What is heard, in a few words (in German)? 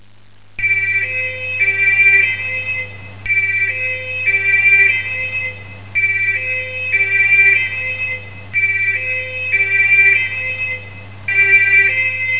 POLIZEI